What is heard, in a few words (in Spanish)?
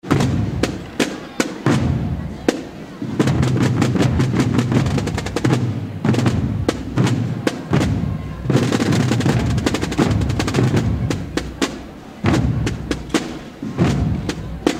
toque tambor; magdalena